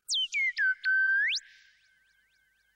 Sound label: Forest Bird